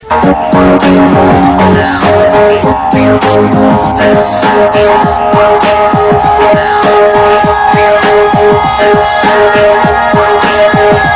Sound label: music